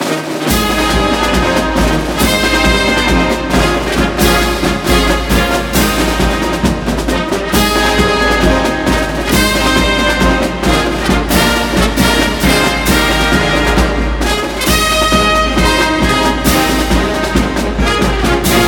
Fight Song; NCAA Basketball 09; PS2, PS3, Xbox 360

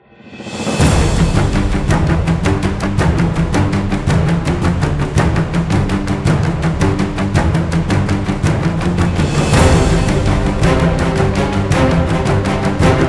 BGM Mission

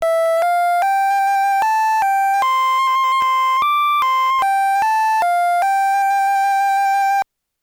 Poké Flute; Pokémon Red Green Blue Yellow

